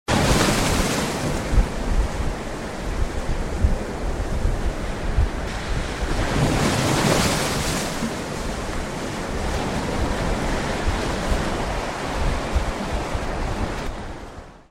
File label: sea; organicsound